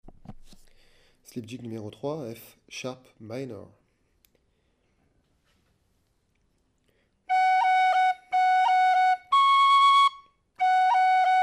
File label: Slip Jig